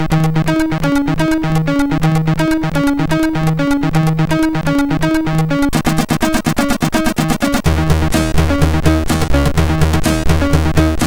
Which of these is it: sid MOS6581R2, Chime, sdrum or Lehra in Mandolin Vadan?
sid MOS6581R2